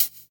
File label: loud high hat sound B key, pedal hi-hat one shot tuned to the B note; hat samples